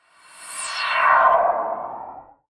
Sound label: Swept Noise